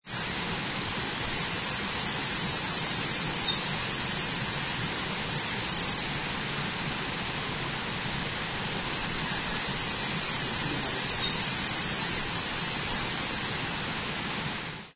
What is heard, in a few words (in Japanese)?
misosazai